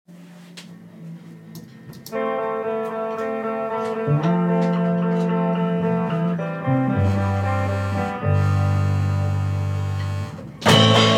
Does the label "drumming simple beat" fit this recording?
Yes